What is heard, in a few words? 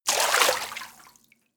splash